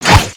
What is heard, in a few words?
Sword Slash; weapons; aronwk collection; ndaudio